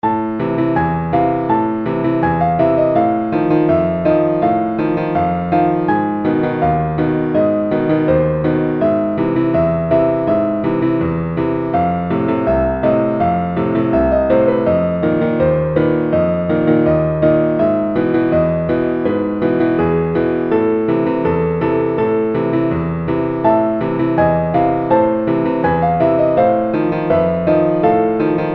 prelude in the hungarian gypsy scale on a

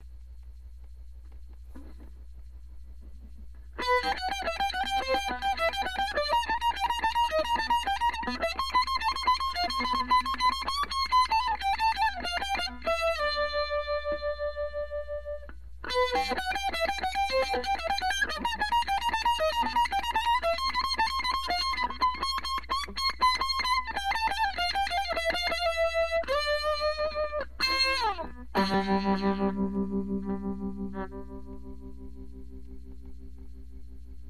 ostinato solo

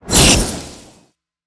Monster; Zenless Zone Zero

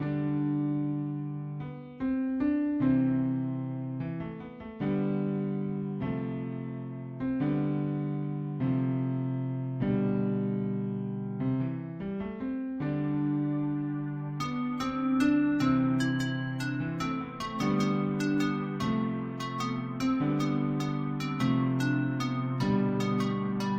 Trap-Hip Hop

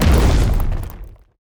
Monster; Zenless Zone Zero

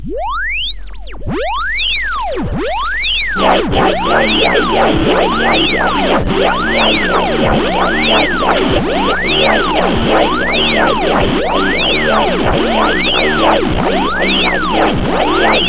alien jam

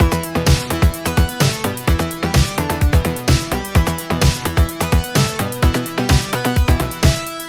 Groovy

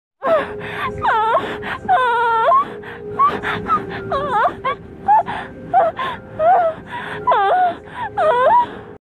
Female moans-ish

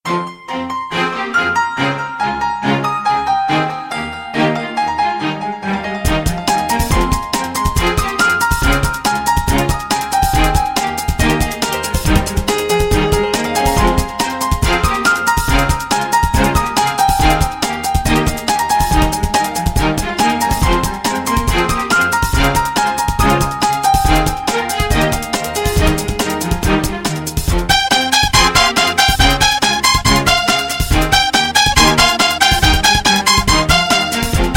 strings and piano

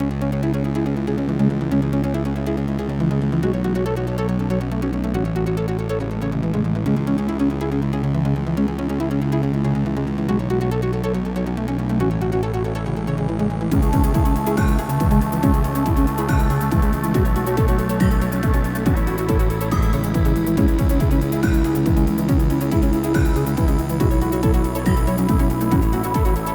attempt at dubstep

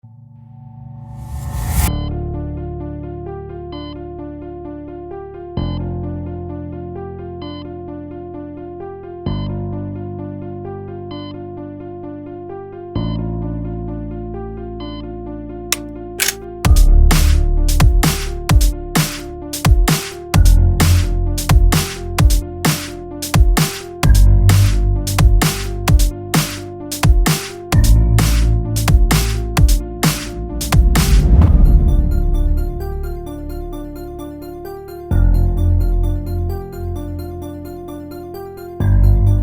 Heartbeat

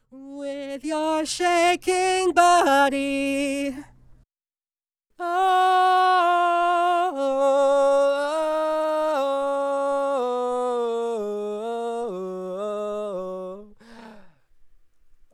misc, Dry Vocal; Pony Fan Music Remix Stems

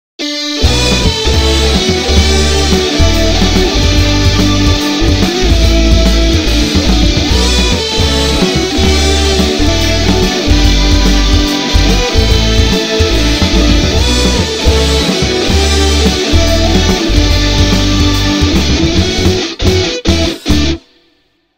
Guitar Solo

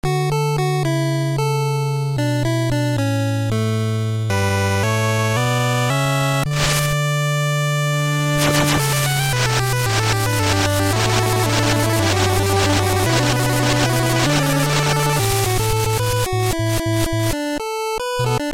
8 bit reversed

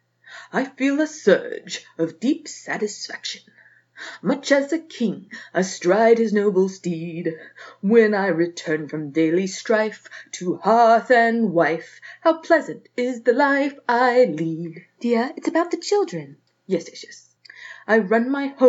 Singing cover silly gender bender; [Disney]; [Mary Poppins]

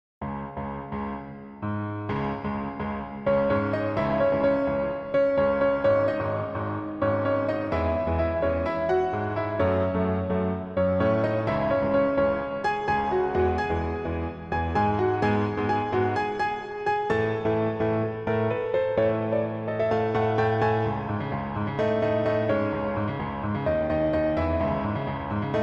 The Spectacle Duet (Piano)

